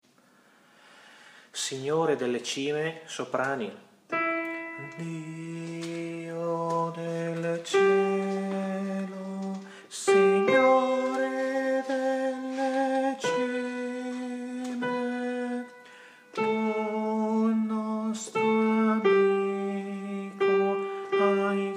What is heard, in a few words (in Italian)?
SOPRANI